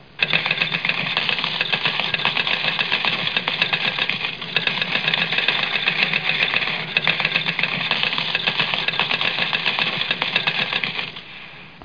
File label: payoff; Bangkok Nights